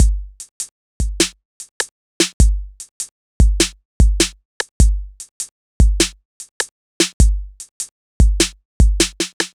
808 Loop Mono

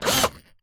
Drill

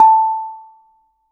Bonang P, Gamelan

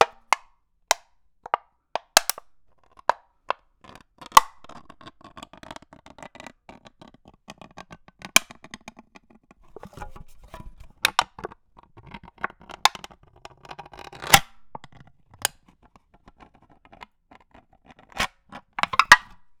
Beer Tin, Drinks Can

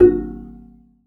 Classical Guitar Extended Technique

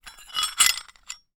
Hole Saw Rattle, Hole Saw Bits

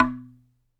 Wooden Bar Strike

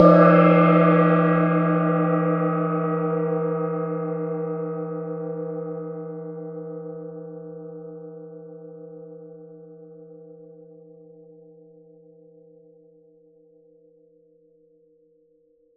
Small Gong; Percussion Samples

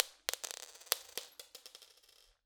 Drop On Floor, Medium Wine Glass

